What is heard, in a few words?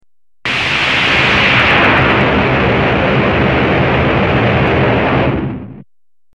thunder